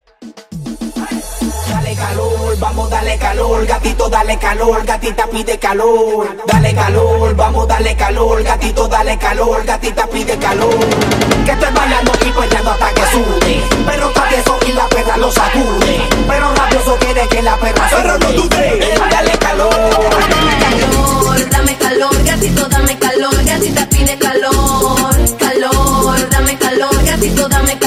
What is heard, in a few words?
Acapella Break Down Reggaeton, 100 BPM